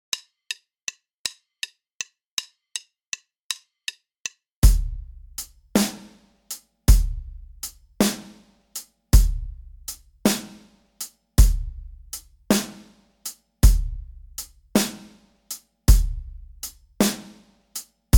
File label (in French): decalage triolets exercice batterie tempo 080; exercices